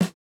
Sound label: snare, snare house